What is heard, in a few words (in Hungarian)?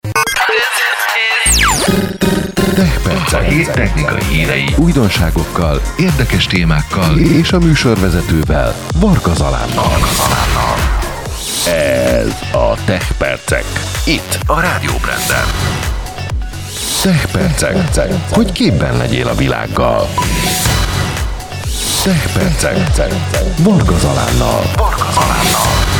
TECHPERCEK SZIGNALOK